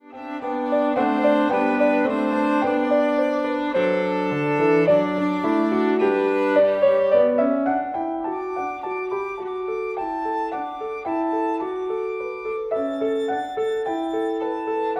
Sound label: VIOLIN